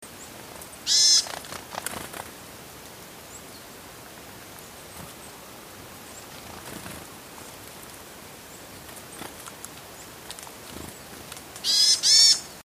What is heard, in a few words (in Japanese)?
yamaga